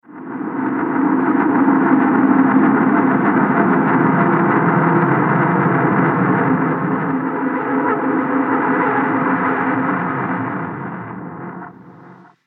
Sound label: Ufo Mothership Lands On Earth; spacecraft; sci-fi; Spacecraft Sound Effects